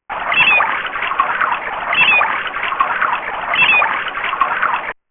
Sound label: Flowing water bird